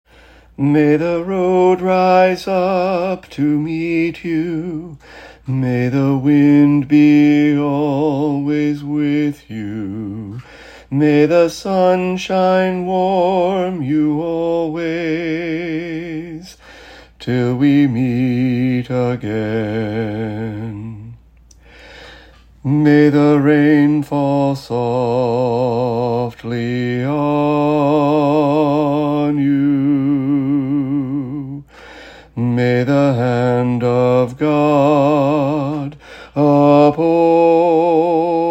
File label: Alto Harmony, choir; St. Anthony of Padua - Choir Sunday